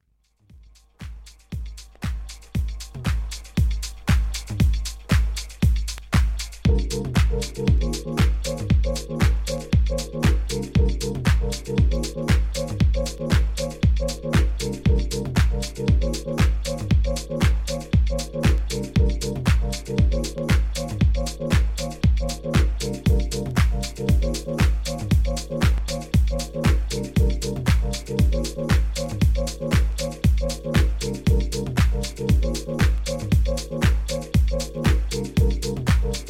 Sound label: Egg Buzzer